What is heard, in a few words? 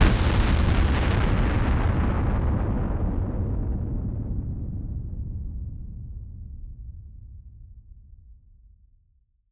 explosion large